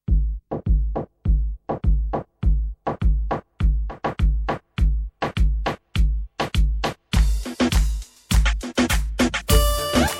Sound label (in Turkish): 2 4 regueton, tyros3